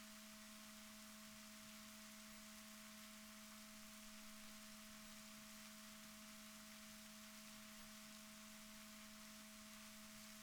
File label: rorquals positif, Balaenoptera Physalus Chile